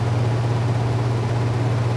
ambient ac machine small

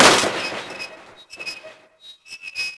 break ceiling fan; breakable

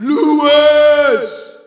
Shout, audience; UFC Tapout 2

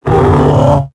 howl; tusken King; Star Wars Obi Wan; XBOX

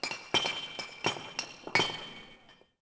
patter, SHIPYARD; AMBIENT; Pirates Of The Caribbean

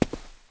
step stone; people; Pirates Of The Caribbean

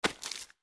FS Mud, footsteps

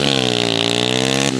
power, Engine

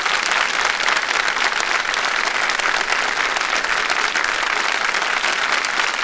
crowd applause; Totaled; XBOX; crowds

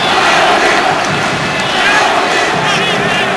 Chant, Crowd; David Beckham Soccer; XBOX

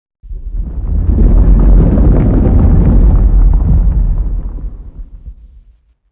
rumble medium; Max Payne

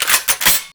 reload jackhammer; weapons; Max Payne

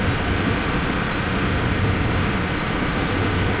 engine; Star Wars Knights Of The Old Republic I; XBOX

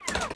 lever; interface

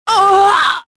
choke

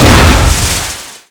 Impact Large; Totaled; XBOX

